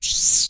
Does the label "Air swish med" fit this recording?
Yes